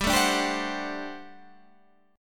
G Major 7th Suspended 2nd Suspended 4th G down Guitar Half Step Down